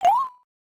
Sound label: fall; shweets sim; snivy